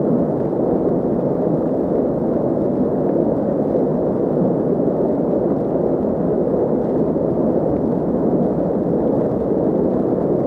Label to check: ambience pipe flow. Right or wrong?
Right